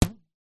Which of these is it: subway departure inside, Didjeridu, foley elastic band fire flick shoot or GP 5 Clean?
foley elastic band fire flick shoot